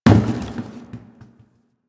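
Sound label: Cardboard Box Impact; Warframe; Ambience; Nineteen Ninety Nine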